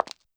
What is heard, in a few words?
Footstep; YAMAHA RM1X